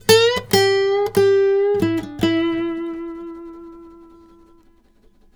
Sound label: BARI LICK, Blues Guitar